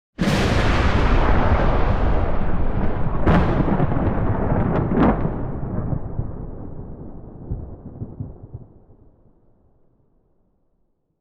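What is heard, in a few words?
thunders ambience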